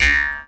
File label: D JAW, Primitive Instruments; Big Fish Audio - Didgeridoo and Other Primitive Instruments; JAW SIMPLE